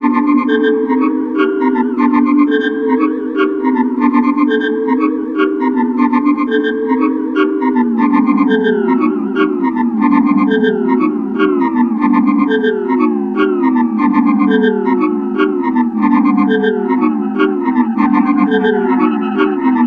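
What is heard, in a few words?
Korg M3R Gamelan Echtzeit Edit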